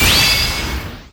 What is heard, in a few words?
Team Skill Tap